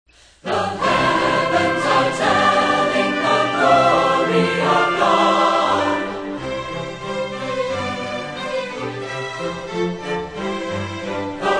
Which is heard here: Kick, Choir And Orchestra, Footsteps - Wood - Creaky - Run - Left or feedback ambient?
Choir And Orchestra